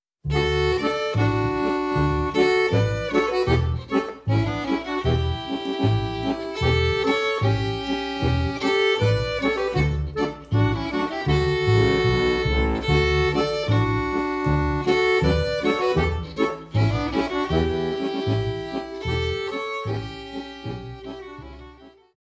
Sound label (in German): Boarisch